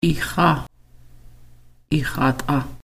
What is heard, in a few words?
smile, laugh